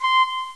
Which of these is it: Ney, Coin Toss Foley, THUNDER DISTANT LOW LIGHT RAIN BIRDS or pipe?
pipe